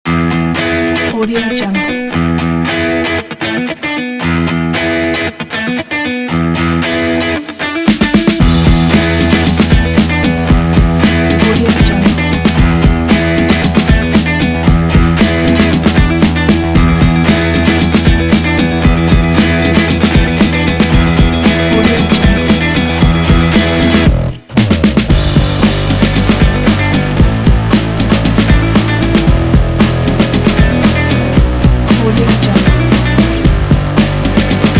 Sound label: advertisement rock